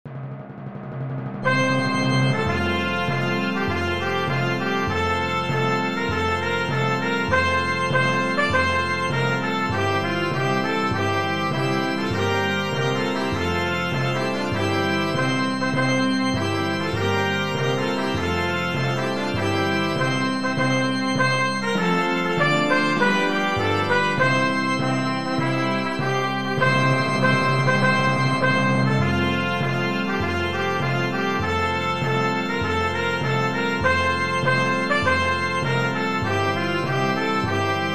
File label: Trumpet Organ Timpani F maj; Fundador